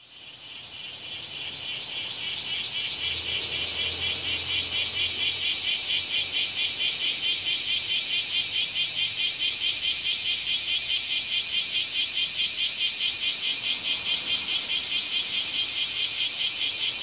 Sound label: kumazemi